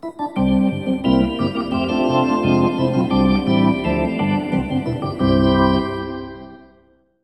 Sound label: Hockey Organ